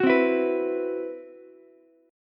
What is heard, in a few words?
C Major 7th Flat 5th, Ukulele, chord; CM7b5 strummed; JGuitar